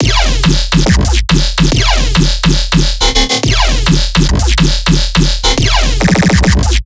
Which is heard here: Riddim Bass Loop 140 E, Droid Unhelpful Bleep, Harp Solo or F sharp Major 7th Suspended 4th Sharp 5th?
Riddim Bass Loop 140 E